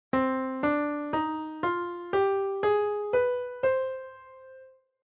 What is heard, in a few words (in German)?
Tonleiter C Moll Harm; Weitere Skalen; Tonarten